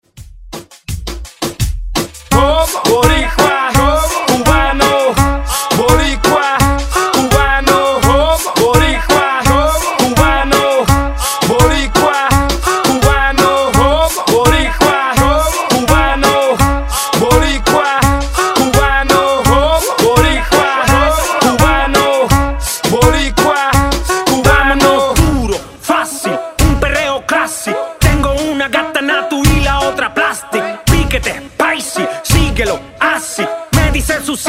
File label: Reggaeton Redrum